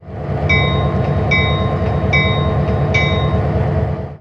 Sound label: Diesel Bell; RailPro